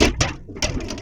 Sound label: SHEETMETAL; MACHINES